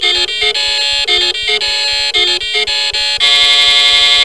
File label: winner, email notification sound; 12 Gauge shotgun; Currently, I am using a 12 Gauge shotgun going off when new mail arrives. CHK-CHK-Boom.